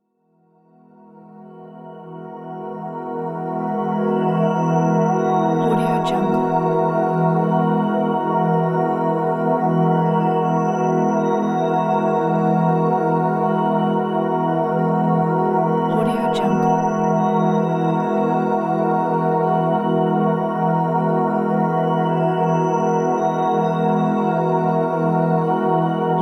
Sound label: short space ambient